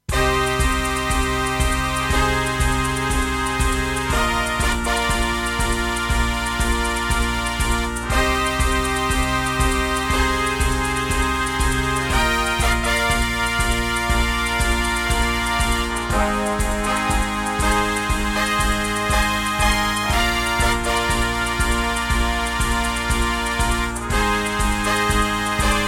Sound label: EMU Sound Engine; Cybernoid II